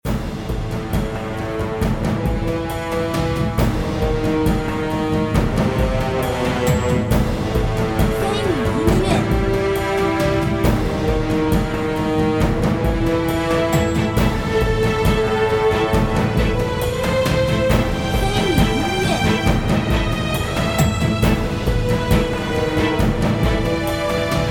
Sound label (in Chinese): 战斗 气势 压抑